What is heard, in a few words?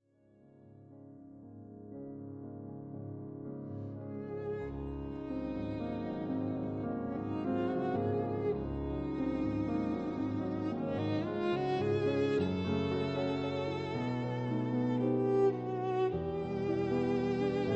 Sonate violon piano